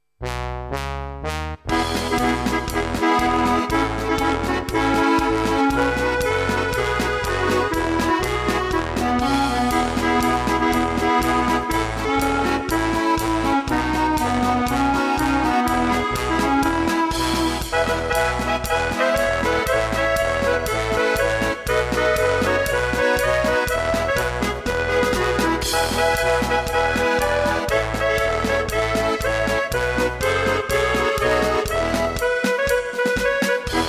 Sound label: Polka